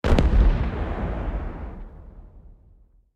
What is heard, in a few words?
explosion far; War Thunder